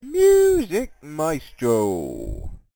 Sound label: sing